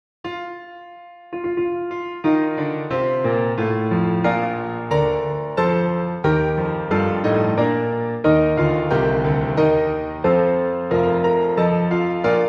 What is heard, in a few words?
Fanfare